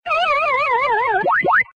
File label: deadtone, notifications; pacman